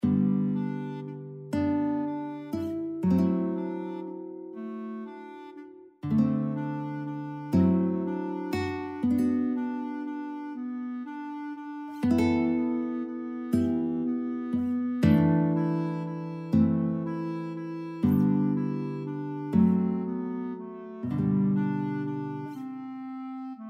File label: skaters, guitar clarinet duet; waldteufel